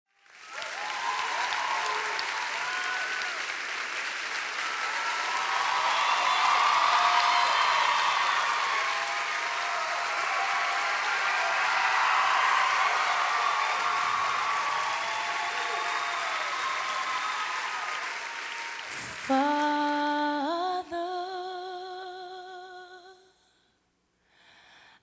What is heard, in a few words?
Intro Prayer (Acappella)